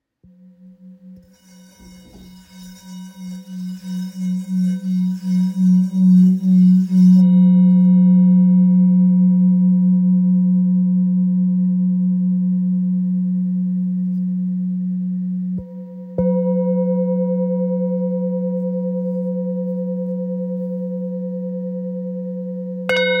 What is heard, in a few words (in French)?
Bol ancien fa diese